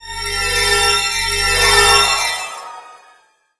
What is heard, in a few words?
Dispel Magic